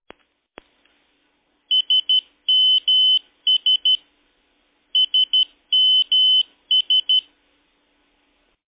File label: nokia old sms tone ringtone